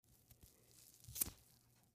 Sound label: MedicalScientific; Soundfx III